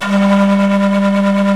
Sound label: SIKU VIB G1; The Andes